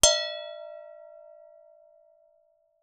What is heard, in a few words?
Strike, Vase